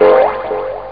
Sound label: Jump Droi; dithell in space